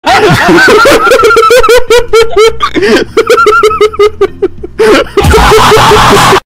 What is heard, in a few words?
giddy sound